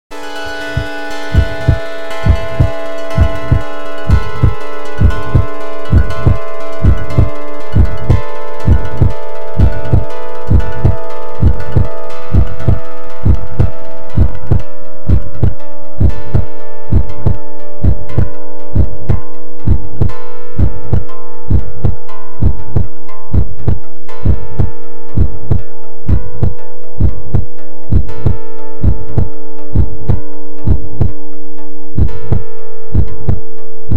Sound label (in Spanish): paisaje sonoro; San Luis